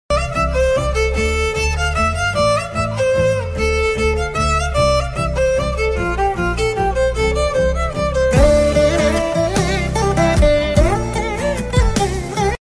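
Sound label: background music love song music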